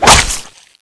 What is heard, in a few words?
hit, weapons; turbulent9; cstrike